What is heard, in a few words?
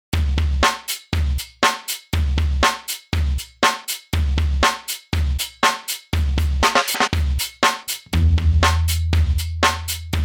Chunky shuffle bass